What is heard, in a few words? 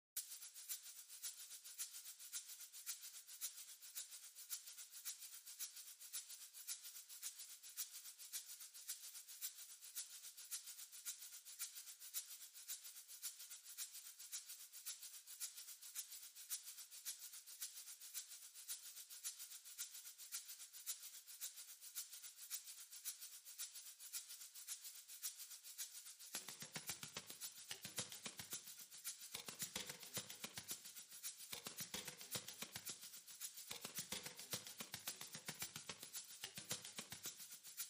Percussion Only